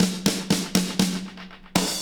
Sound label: 120 fills; Sound & Vision - Gigapack I